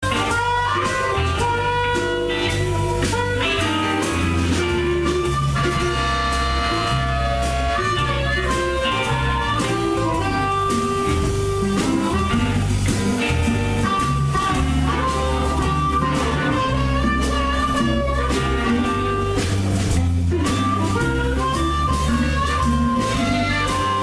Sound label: harp